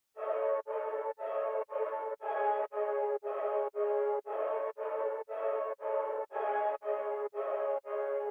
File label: 117 BPM C# Min Ghost Pad; Melody; Loop Stems; Cymatics; 2022 Melody Collection - Various; Estimate